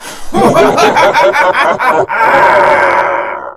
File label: mom appear; mothershadow